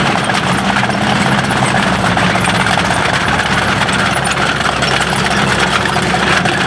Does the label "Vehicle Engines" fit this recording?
Yes